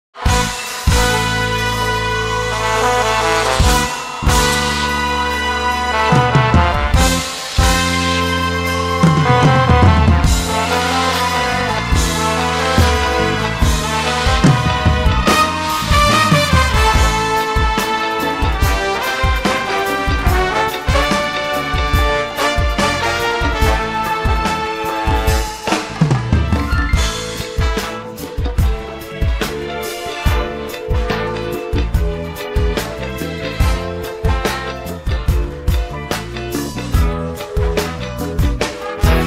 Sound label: Concert